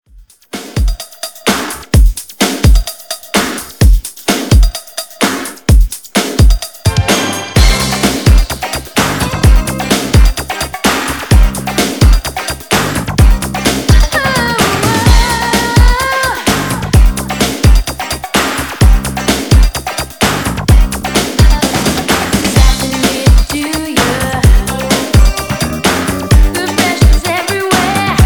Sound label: 128 Bpm